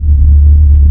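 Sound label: sub loop, ships engine; Quantum Redshift; XBOX; kumiko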